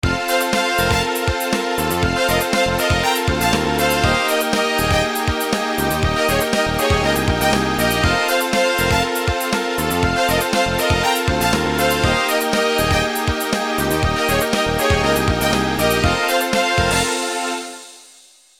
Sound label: PASSED; EDGE RALLY 2